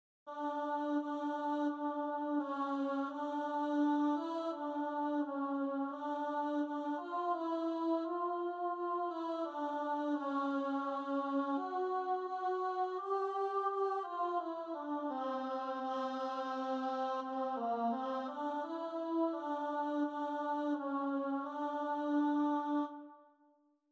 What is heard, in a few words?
Alto